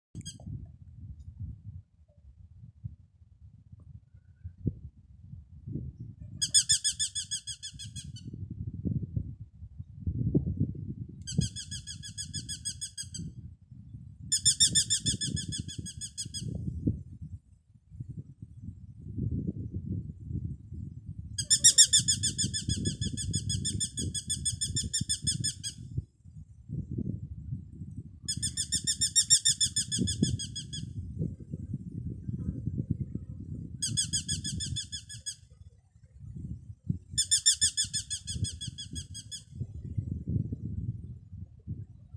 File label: Eurasian hobby